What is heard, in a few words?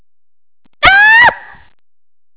shriek